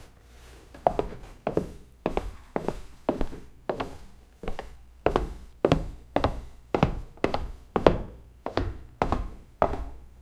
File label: old man stairs steps